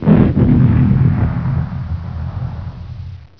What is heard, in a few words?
battle